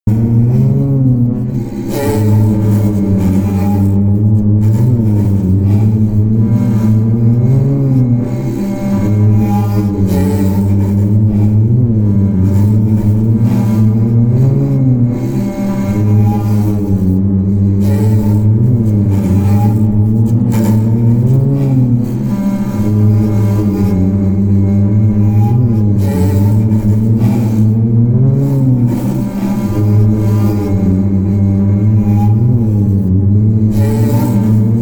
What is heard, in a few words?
drone erratic didgeridoo low